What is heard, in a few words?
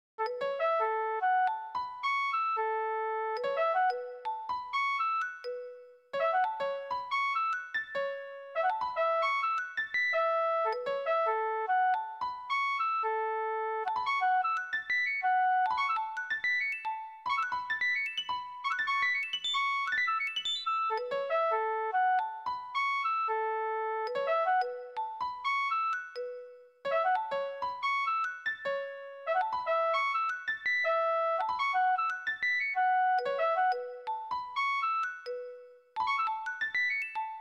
flute